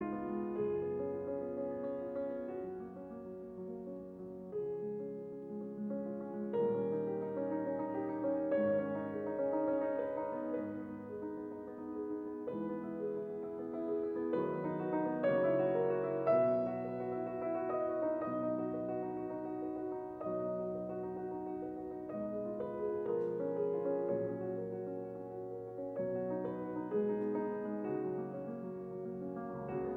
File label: Impromptus for piano; Schubert; D899 Op 90 nr 3